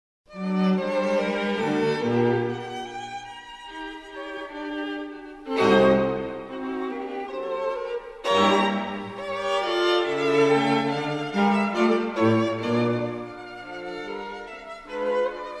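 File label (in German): Streichquintett Menuetto Allegro; Mozart; Allegretto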